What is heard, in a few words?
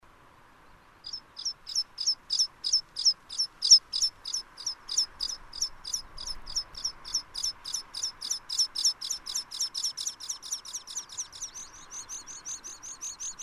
Antpet, Anthus petrosus; Luotokirvinen / Rock Pipit (Anthus petrosus); 14.5.2005 Porvoo, Söderskär; laulusäe lennosta / Song strophe from flight